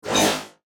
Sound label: FLASH ELEMENT HIGH TECH